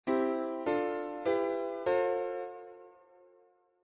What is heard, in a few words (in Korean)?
화음 15cent